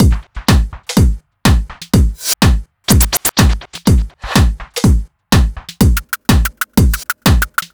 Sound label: Drum Loop 124 Stripped